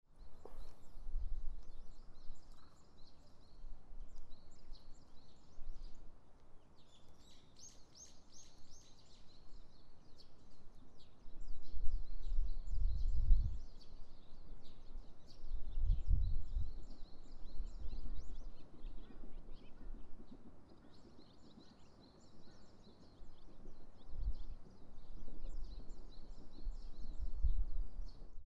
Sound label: sinobuyama park